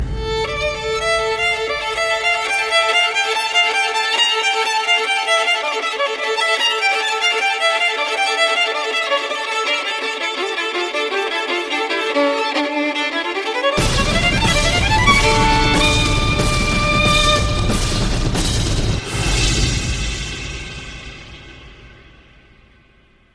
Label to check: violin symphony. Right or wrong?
Right